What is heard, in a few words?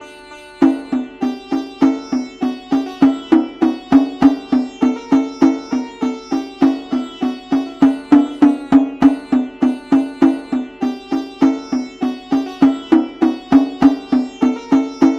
Indian Ghatam; Drumwavy; Syntheway